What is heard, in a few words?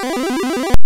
arp 8bit